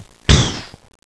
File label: shotgun